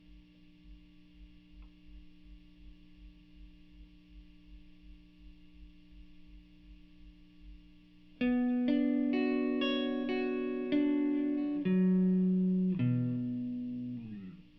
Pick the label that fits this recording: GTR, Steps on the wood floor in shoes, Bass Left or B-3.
GTR